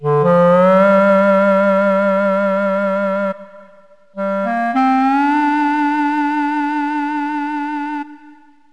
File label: l sax solo; Wired for Sound Pro CD; Aristosoft, Inc.